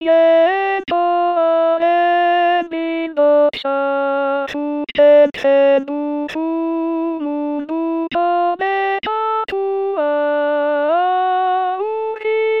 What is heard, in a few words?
Jaink Bild Alto